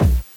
kick, NES DPCM

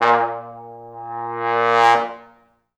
Pop Brass; Best Service ProSamples vol.19; AKAI

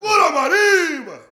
BRAZIL, World Voices

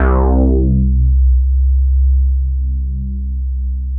Synths BASS

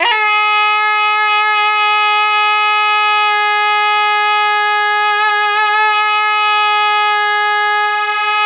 BI SUA G#4, BISUA REED; Spectrasonics; Heart of Asia

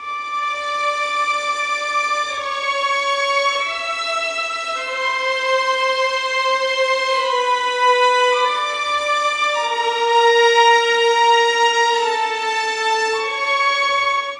Violins, Cinematic; Sonic Foundry (Sony Creative Software); Orchestral Series VOL-3; Cinematic 16

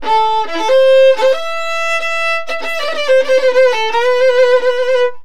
VIOLIN, Balkanish Traditions

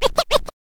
scratch kit, vinyl

